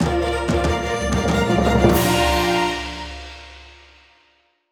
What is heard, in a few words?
(Jingle) Level Complete; Mario vs. Donkey Kong